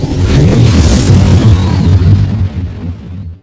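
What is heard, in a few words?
big teleport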